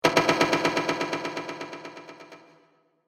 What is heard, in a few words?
Vibrating Decay Horror Ambience; Evil Sound Effects